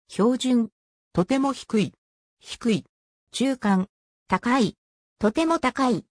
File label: pitch